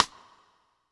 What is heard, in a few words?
SIDESTICKS